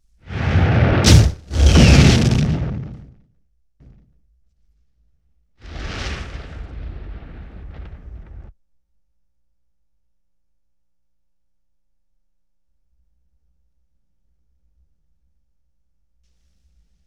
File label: Explosion, 5.1 Surround